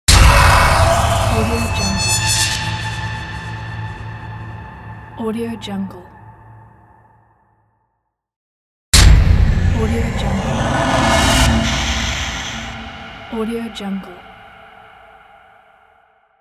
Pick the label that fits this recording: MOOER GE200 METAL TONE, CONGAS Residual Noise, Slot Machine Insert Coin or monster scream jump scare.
monster scream jump scare